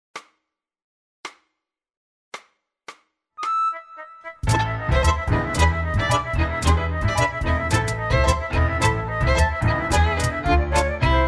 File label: flute, Am